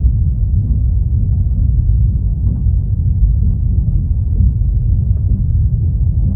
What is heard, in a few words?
Boat Engine Hum Under, underwater